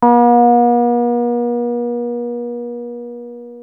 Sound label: 303 A3, TB 303; Hollywood Edge; Giorgio Moroder Rare Synthesizer Collection